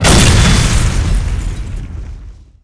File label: plasma Tank explo; impacts explos